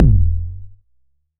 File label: 808 BD long, Roland-808 Drum Hits Bass Drum